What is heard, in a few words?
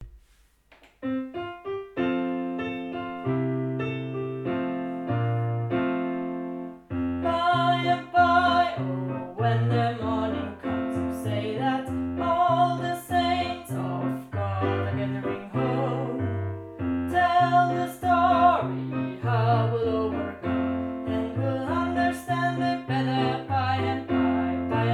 By and by Bass